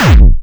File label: Bassdrums Hard Kicks; Vengeance Samples